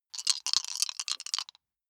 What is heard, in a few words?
big three rattle in hand closed